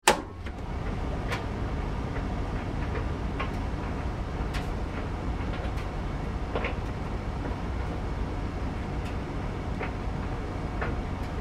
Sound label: Tumble Dryer start run off - Drying clothes; Appliances; Household; Tom Hutchings